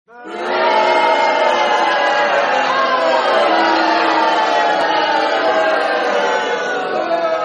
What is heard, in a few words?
crowd booing